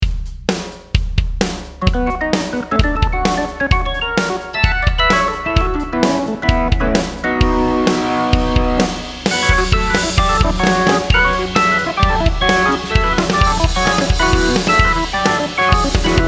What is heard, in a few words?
Hammond XK 4; Emerson